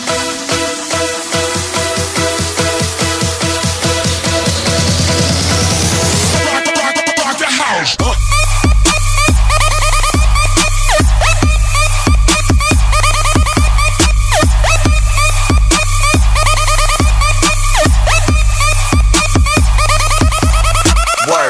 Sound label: Trap mix